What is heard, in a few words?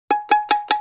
Pizzicato Loop; Vintage Cartoon; Musical Elements